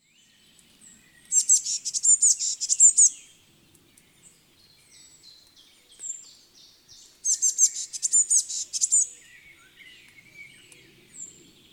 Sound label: Cyanistes caeruleus; SETE